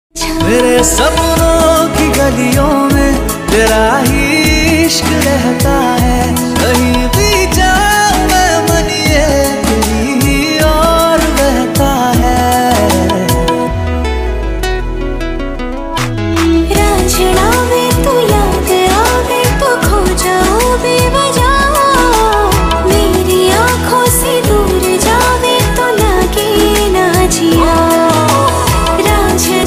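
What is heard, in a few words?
romantic ringtone love ringtone hindi ringtone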